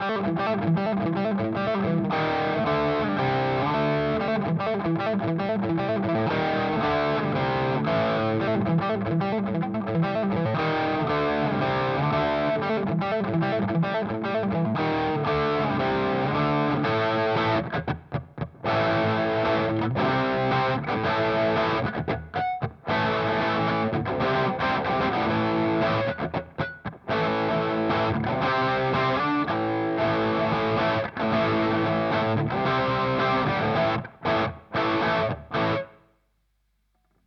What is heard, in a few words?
HX Stomp Distortion Boss SD-1; Vergleichstest